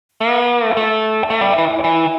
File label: Lick